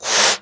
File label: balloon inflate